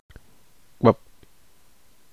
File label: sound of the eka ibit a large drum; ibibio